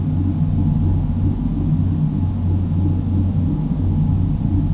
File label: machines; de Nostromo